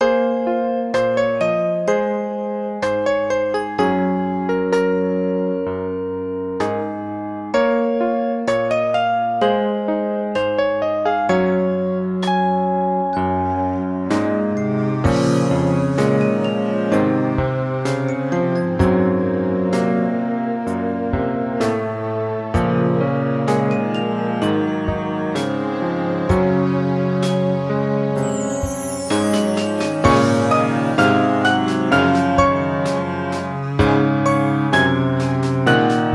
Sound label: BGM; Adventure Player; psp